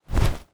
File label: Unstable Bat Wings Flap; TANK; 3Souls